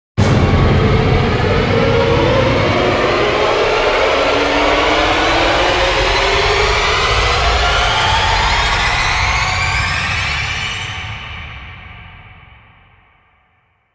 Orchestral Riser; Epic Tools vol. 1

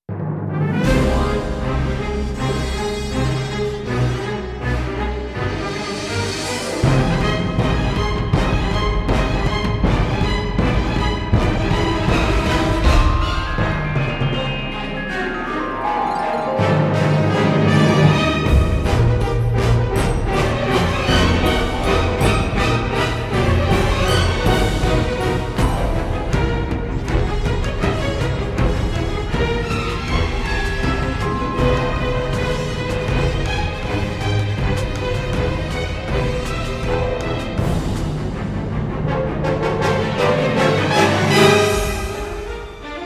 Dark Fantasy (No Choir); Fired Earth Music

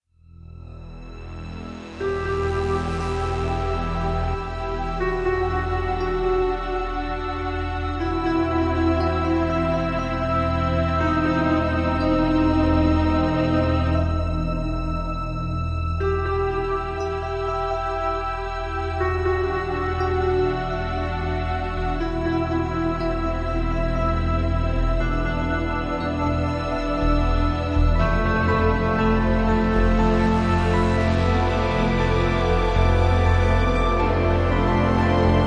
Ambient

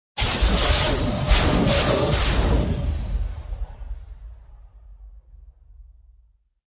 Storms Stinger; Gothic Storm Music; Advanced Sound Design 2012

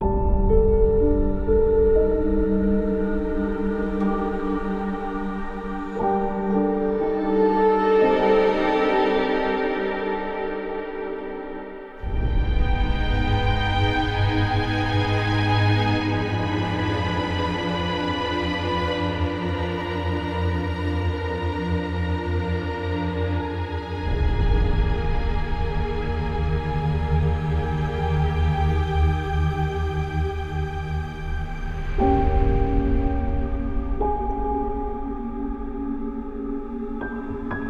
Outpouring Ambient

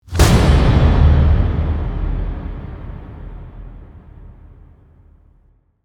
Fatal Hit Epic; Ninja Tracks

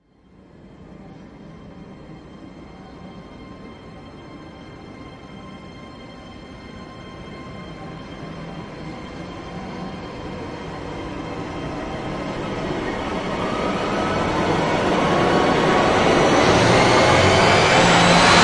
Rise High Sea; Brand X Music; Brand X Music Catalogue - Editor's Essentials

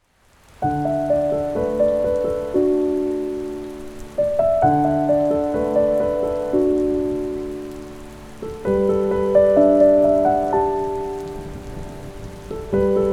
Calming Storm, Piano & Rain

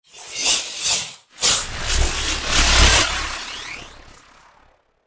Storms Meteor

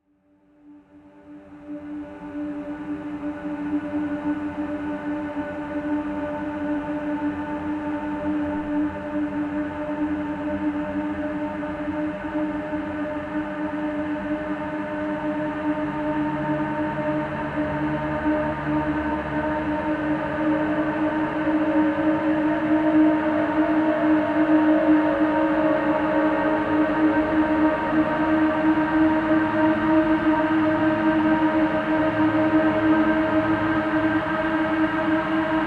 Rise; Ninja Tracks

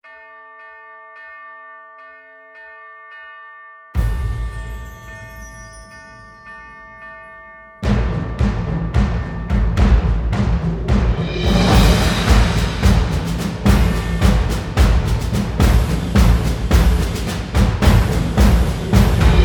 Drums Only Version

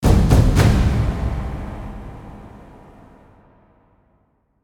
Hit Big One Two Three; Brand X Music; Brand X Music Catalogue - Editor's Essentials

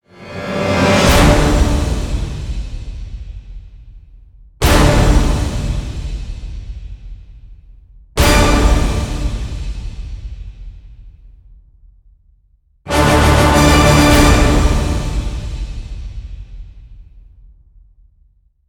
Orchestral End Hits (D); Epic Tools vol. 1

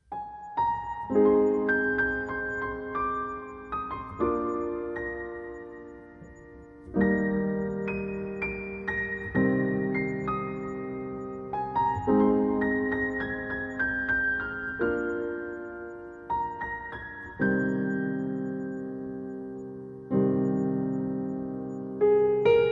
Piano and Cello

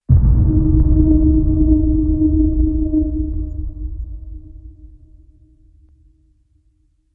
Haunting Hit Deep

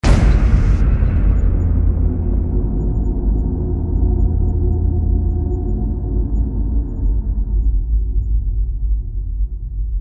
Hit

